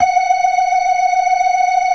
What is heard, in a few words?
FAST VIB, TREMELO+VIB, Synth and Organ